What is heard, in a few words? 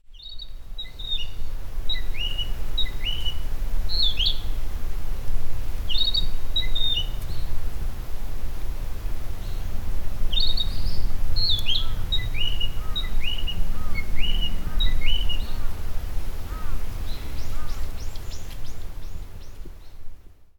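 kibitaki, narcissus flycatcher; bird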